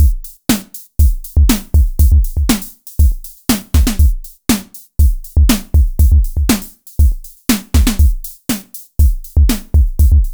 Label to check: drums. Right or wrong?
Right